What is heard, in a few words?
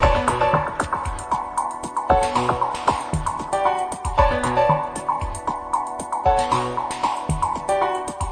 115 piano loop; MELODIC LOOPS; SAMPLED MUSIC LOOPS